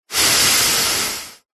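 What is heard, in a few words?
human male exhale breath out through nose; zapsplat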